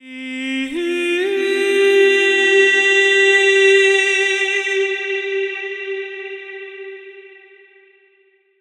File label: Main Vocals Outro - Cm 70bpm (WET); Construction Kits; Ghosthack; SkyRealm; Kit 19 - Ode To Daenerys